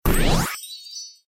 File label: prop ready; BingoGrassland